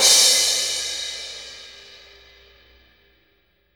Cymbals crash; Vengeance Samples

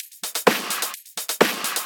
Electro Loop 128 BPM; Vengeance Samples

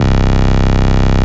Basses Root C; Vengeance Samples

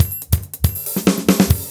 Fill 140 BPM

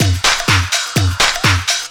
drm 125, drumloops; house; PS-28 Vocal House Samples; inthemix

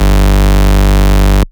Bass Cuts; Vengeance Samples; VES1 Instruments

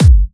Hard Kicks; Vengeance Samples

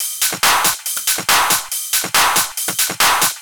Loop 140 BPM; Vengeance Samples

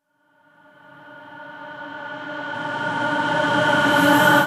reverse, FX; Vengeance Samples

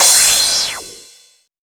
Crash, Cymbals; Vengeance Samples

